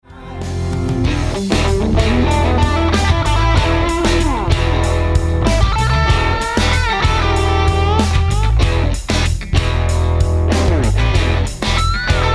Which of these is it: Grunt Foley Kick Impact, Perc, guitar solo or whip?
guitar solo